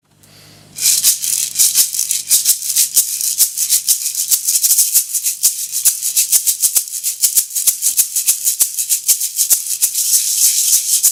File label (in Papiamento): Maraca